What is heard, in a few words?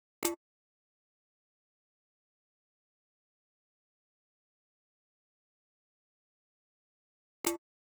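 Drum Loop 133 BPM Percussion; Drum Loops; Loop Stems; Cymatics; Mystery Pack Vol 4; Enemies Drum Loop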